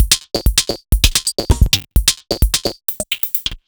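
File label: Odd Glitch, 130 BPM, Percussion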